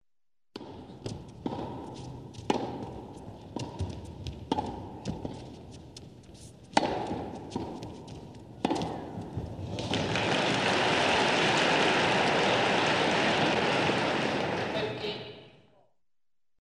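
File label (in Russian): теннис аплодисменты зрителей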